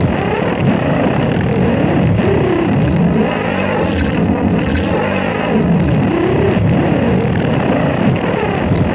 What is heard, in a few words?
CPU YAWN; Spectrasonic Distorted Reality 2; NASTYFUZZ